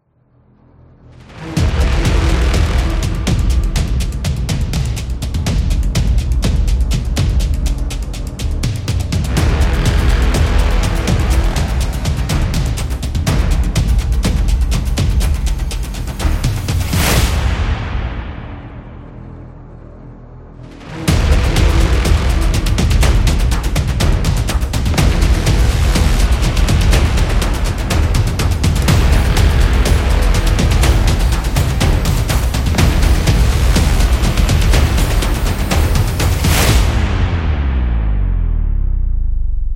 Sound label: Epic Drums Action